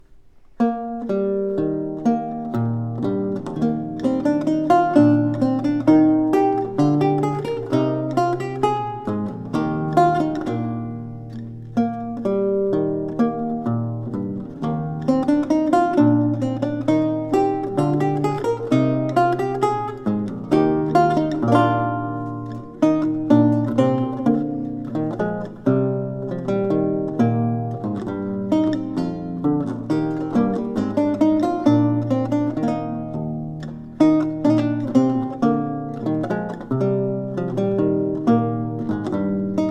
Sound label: Courante; Bocquet; Cherbury